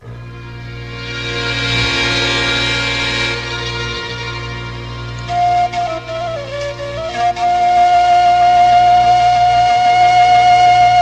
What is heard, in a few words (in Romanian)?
Doina Ciobaneasca, Roumanie